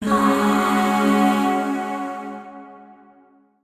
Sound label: Shawzin Yo Chord; Warframe; Zariman; Void Shawzin; Chords